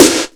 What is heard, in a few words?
D3H-SNR; Zero G; Man Machine